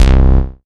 Bass C, Offbeat Bass

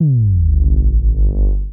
SubFx Clean 138BPM; Sound FX; Gminor

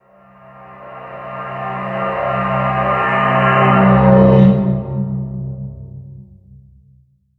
VFX Atmos

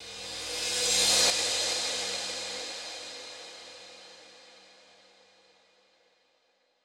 ReCrash 138BPM; Sound FX; F major; Vengeance Samples; Another Day Kit

